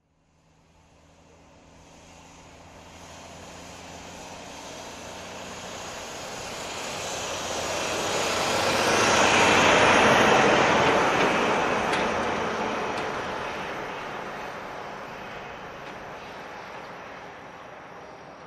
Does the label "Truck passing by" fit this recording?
Yes